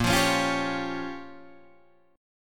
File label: A sharp Minor 6th A sharp down Guitar DADGAD, chord